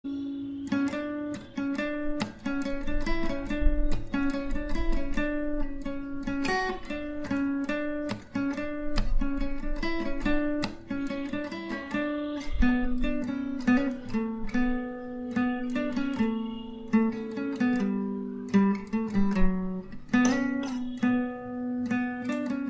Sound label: guitar ringtone; rhtdm; signature guitar